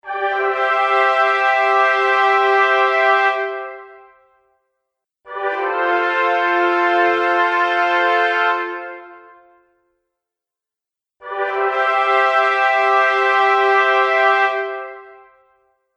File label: Short Epic Fanfare Collection; Musical